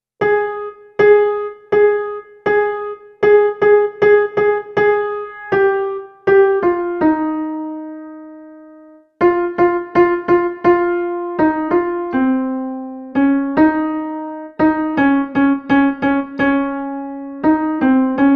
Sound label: Melody only in A flat